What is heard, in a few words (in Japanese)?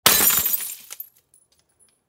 ガラスが割れる音